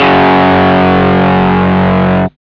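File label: GUI DIS, distortion guitar; Sound & Vision; Gigapack I CD 2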